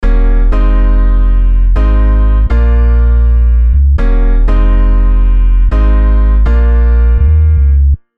Just Chords Bass

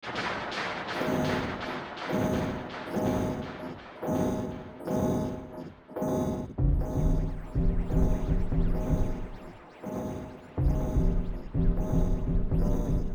Synth Bass; ALIENS; L pitch 500 cents R pitch 950 cents

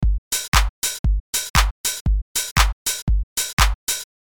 swing

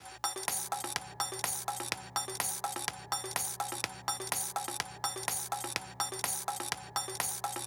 Convolve

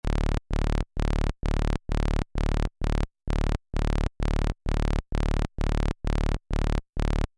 minitaur techno

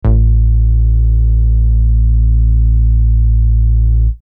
Bass

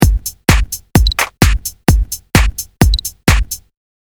Drums

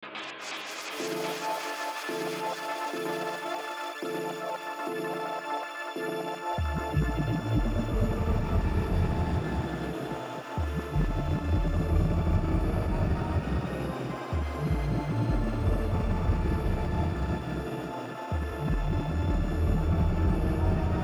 Synth Bass; CRYSTAL ECHOES 64

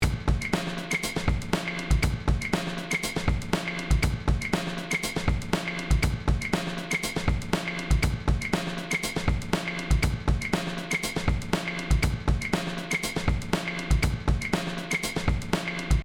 Drums Squish

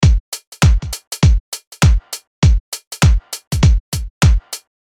Drums

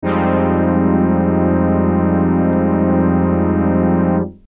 Swell Vinyl

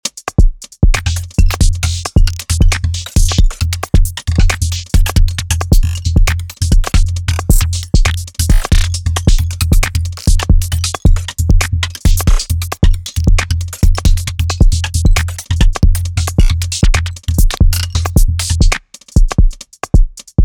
Glitch Hats Panned

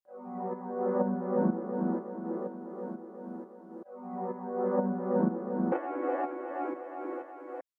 Pad; No Brauer

